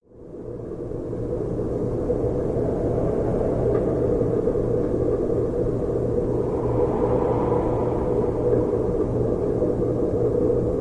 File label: River Effect Played, drones